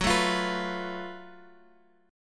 Gb Minor Major 7th Double Flat 5th, Guitar, Open G, chord